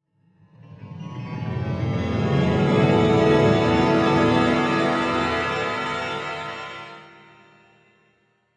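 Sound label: Bell Tone; Freed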